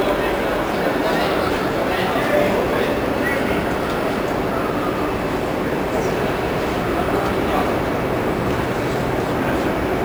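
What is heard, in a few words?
Amsterdam train arriving, exterior; ENV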